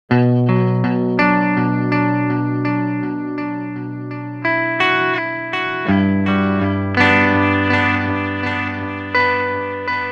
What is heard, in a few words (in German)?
Boss ME 90 Direkt Brit Stack Standard Delay